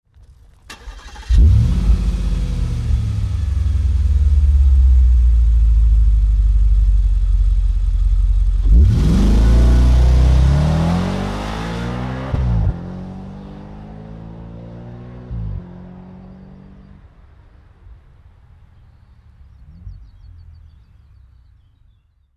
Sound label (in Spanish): sonido audi rs5 cabrio